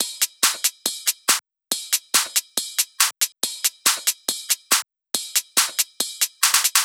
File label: drum Loop 140BPM Perc